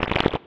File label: photosynthesis hover; carbon cycle